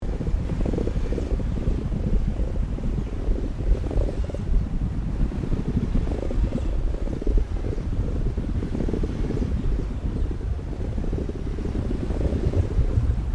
Created Ambience Viscous Organics Loop, Artificial Sound Effects; Various Ambience created through sound manipulation in FMOD